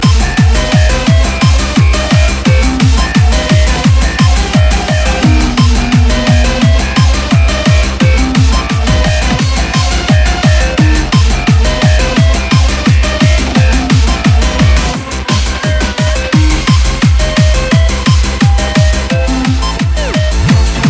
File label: Fast Beats